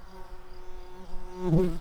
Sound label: bumblebees, Hypnorum workers